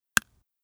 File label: scale